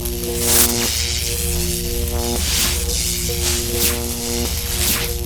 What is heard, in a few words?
steam golem power fist loop; LoL; blitzcrank